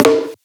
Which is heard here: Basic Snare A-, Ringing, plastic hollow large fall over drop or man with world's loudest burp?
Basic Snare A-